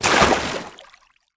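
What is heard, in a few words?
footstep water sprint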